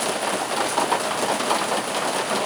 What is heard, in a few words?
rain; Soldier Of Fortune II Double Helix; XBOX; ambience